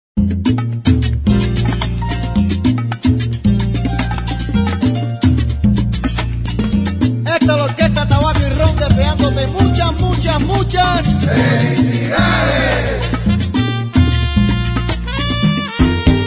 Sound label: Happy Birthday Zumba; Comemorativas; Aniversario